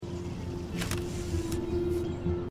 menu start; life is strange

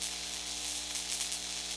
electric; Cut the Rope

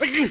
sneezing; Metal Gear Solid; gamesoundopedia

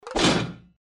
bear trap

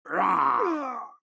heavy attack voice; for honor

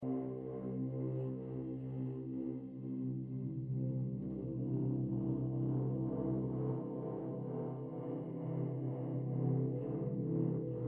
dorm night musik; life is strange

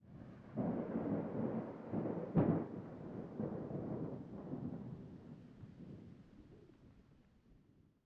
atm thunder distant; pineview drive

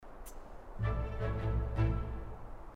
mood focused; sims 4

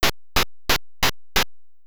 player enters door; 8 eyes

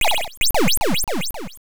enemy explosion maybe; castlevania

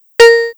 catch health; Pinocchio 1995 SNES; gamesoundopedia

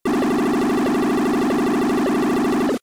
typewriting; cave story

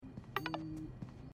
new message sound; Life is Strange 2; gamesoundopedia

